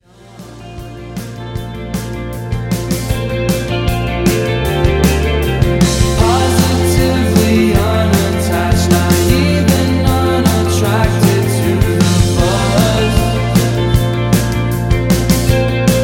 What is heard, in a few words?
humming